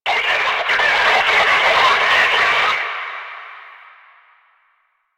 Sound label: Enemy Gather Stinger; Hazards Ville; Warframe; Nineteen Ninety Nine; Quests